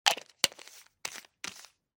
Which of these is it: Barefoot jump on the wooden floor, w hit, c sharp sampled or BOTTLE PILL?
BOTTLE PILL